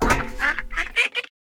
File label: duck